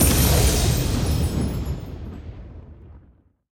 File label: big win changed; netent; victorious